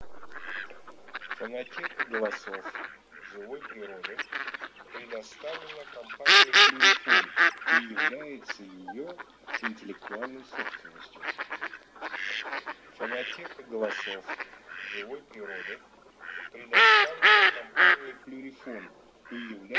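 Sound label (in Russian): Кряква Germano, манок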